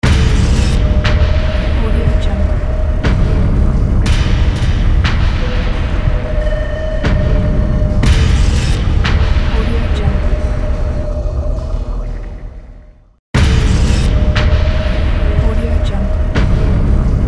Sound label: heavy rust loop